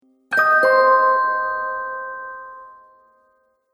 Elf Harp Magical Gliss; Magic Sound Effects

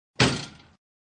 Door Mosquito Net Slam And Rattle; Miscellaneous Doors

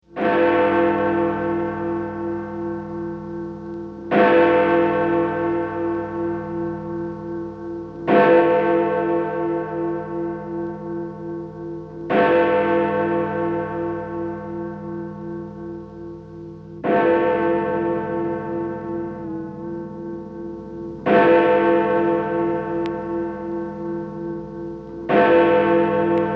Tower Bell Twelve O Clock; Bell Sound Effects; Bells (Sound Effects:Musical)

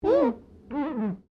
GLASS SQUEAK FINGER; Material components for constructing more complex sounds; Materials; Foundation

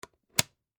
Wood Box Close, Small; Foley; BlastWave

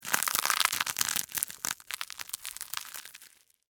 Crunch Cereal Slow, Honey, Oats; Texture; Crunches; BlastWave

